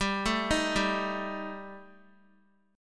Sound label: G Suspended 2nd Sharp 5th, Guitar; arpeggiated